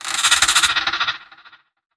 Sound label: Lurker Sniff; Evolve; Gorgon